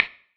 perc organic clicky

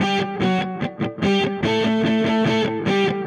Dirty Chunk Guitar, Indie Rock

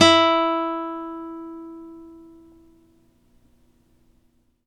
NYLON F E3, GUITARS; Synclavier Sampler Library

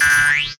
Boing, cartoon; Classic SFX; musicradar; cartoon caper

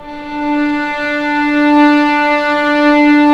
STR VLS, String Master Series, Vlns 6 p-mf

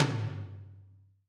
Lo Cannon; Alesis SR16

